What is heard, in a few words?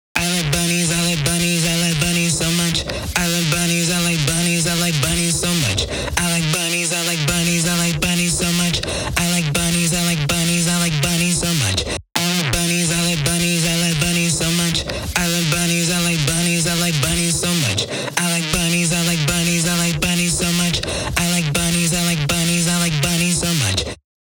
vocals hook low